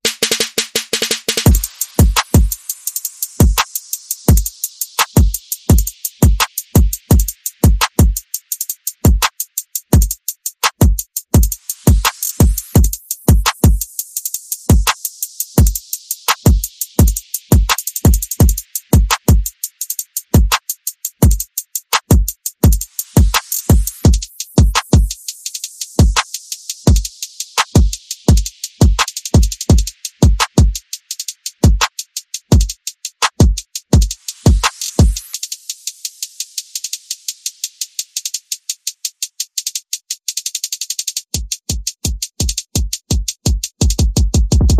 Perc and FX

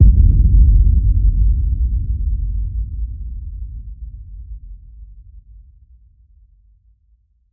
Boom

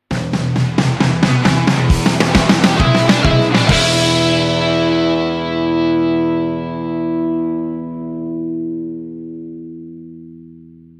Hard Working Blues, Sting

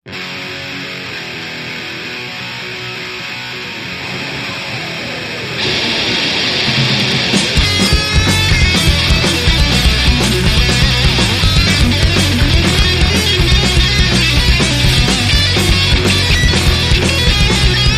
w Lead Guitar

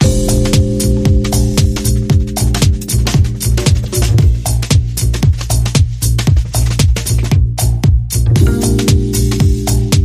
Jazzcat Underscore

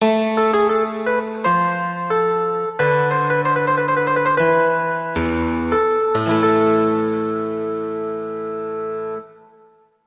Harpsichord Classical Bridge

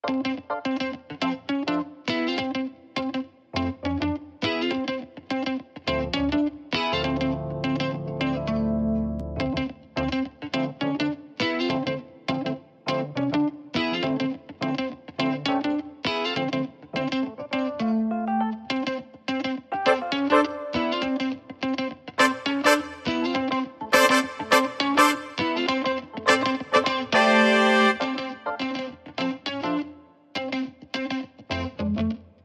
Keys Guitar Brass Only

